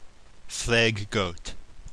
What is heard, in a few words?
flag goat